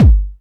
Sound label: smooth kick drum g key, Royality free kickdrum sample tuned to the G note. Loudest frequency: 134Hz